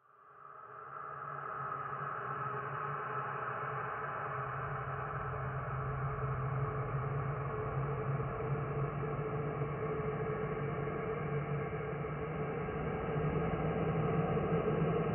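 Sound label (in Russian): звучание в стиле хоррор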